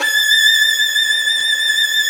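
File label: violin vibrato, Orchestral; Violins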